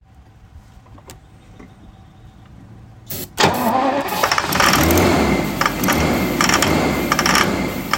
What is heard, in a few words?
no crank 1986 xjs v12; x27